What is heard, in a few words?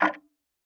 Tick, Clocks Kitchen Clock